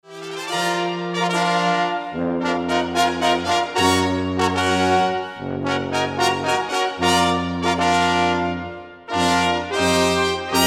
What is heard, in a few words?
Fanfare